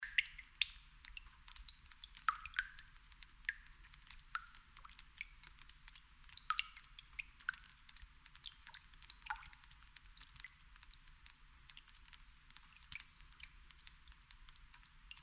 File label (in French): gouttes rapides